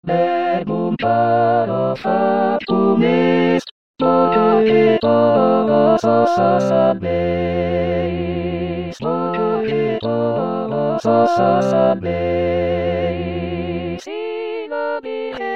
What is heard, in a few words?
verbum caro todos